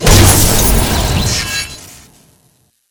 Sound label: warp; w scout